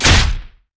basicattack dragon; LoL; shyvana

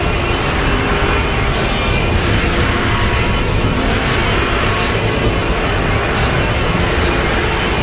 creeping death; Mordekaiser

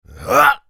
effort

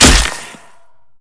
basic attacks; Zyra

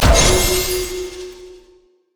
vortex; LoL; diana skin02

